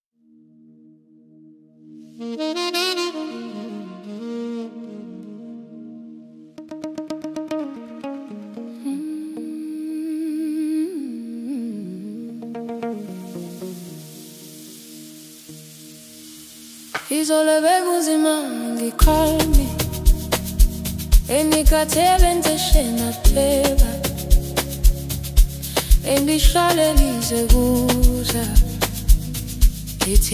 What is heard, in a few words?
Soulful Amapiano x Afrobeats Saxophone